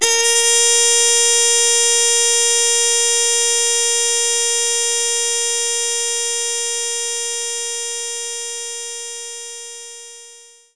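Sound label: Muted Trumpet A sharp 4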